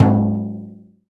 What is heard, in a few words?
OPEN HAND, Timbale; Timbale Battery